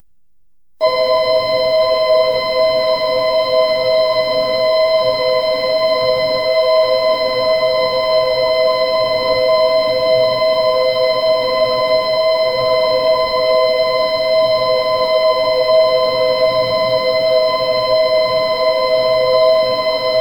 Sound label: a gentle soft sci fi, computer